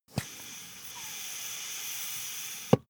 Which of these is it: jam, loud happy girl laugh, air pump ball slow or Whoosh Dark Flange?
air pump ball slow